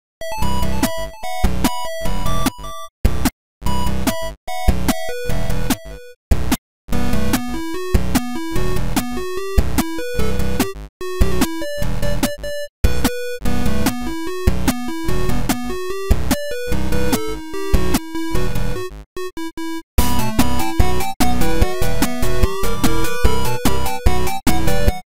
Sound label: 8Bit